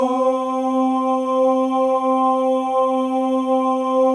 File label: MALE OHS; Spectrasonics; Symphony of Voices